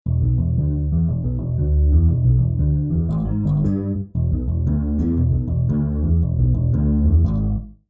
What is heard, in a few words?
grungy bi amp bass